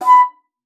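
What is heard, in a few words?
B3, bass flute; Xsample VOL-2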